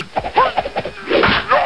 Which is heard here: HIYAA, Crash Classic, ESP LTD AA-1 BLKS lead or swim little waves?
HIYAA